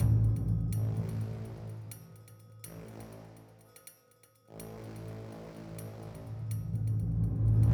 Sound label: Music Tension Pulse Med Tempo; Battlefield 3